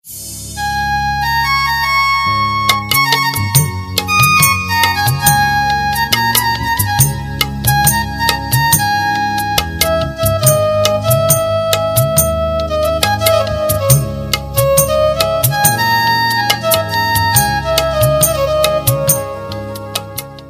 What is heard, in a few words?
Digital Tune